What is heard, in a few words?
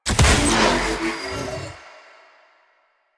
Bullet Jump, Motorcycle; Motorcycle Ninety Nine